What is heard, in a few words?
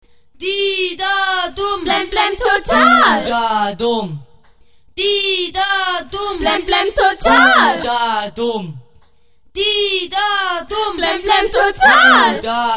Stomp